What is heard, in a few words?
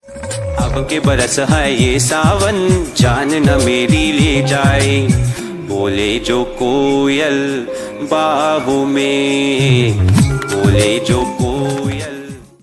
Ringtone